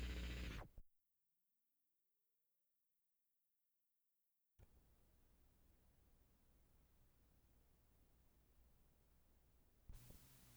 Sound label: farfisa II